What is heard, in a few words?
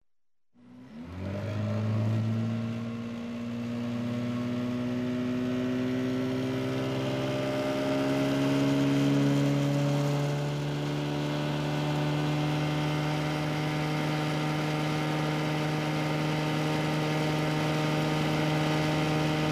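motorboat 40 hp constant speed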